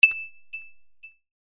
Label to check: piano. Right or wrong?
Wrong